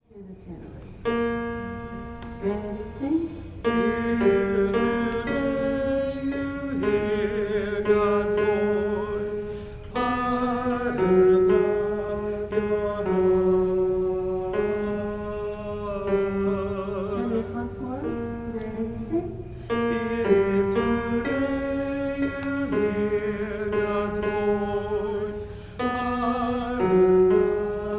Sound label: Psalm 95 If Today You Hear God's Voice tenor